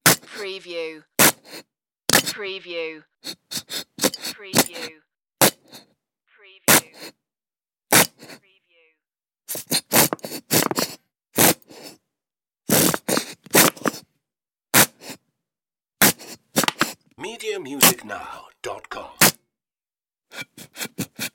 empty bbq sauce

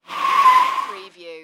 Car or motorcycle brake, tyre screech

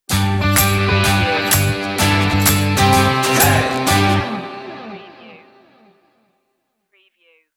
joyride stinger

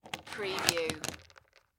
Door Chain Lock Slide

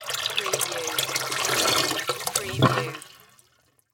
Jug Pour Out Empty; Sound Effects Bible

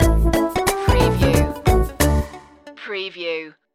retro video game labyrinth stinger

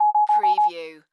Computer Readout

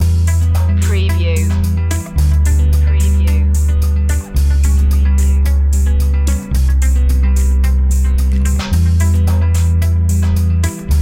positive guitar background loop

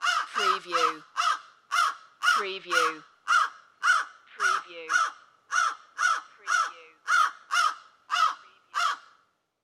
Crow Caw Multiple Long

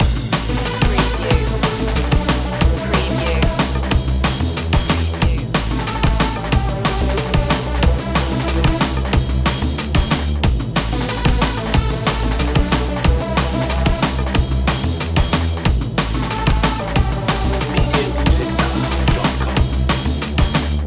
night drive loop

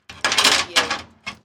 Chain Metal Clanging

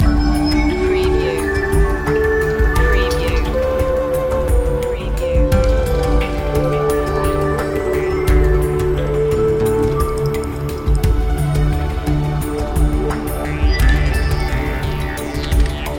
rainforest loop

